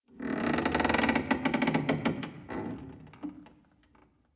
wood sfx; cstrike; mansion

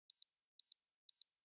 c4 beep; czero opforce

